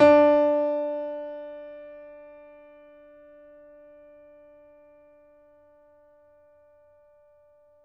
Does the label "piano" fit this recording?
Yes